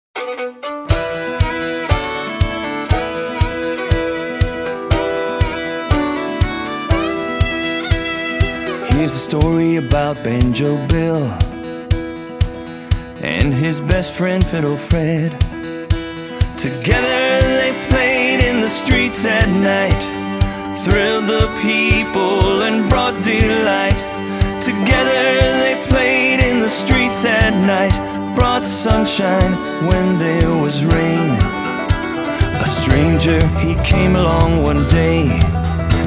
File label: Banjo And Fiddle Mountain